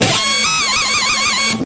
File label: Guitar Wail